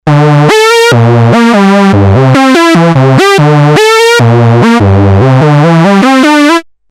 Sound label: pwm